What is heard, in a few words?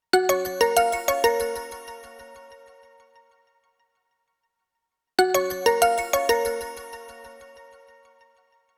Quietly, ringtones; Elephone; U-Pro